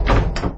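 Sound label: door close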